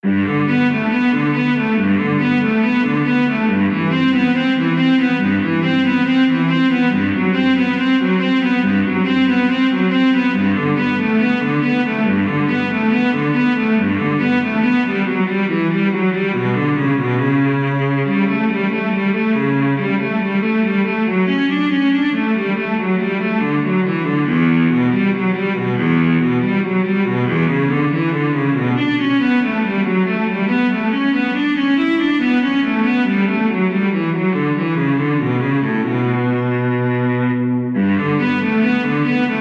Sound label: bach cello suite; ВидеоМОНТАЖ 23.0; ams music library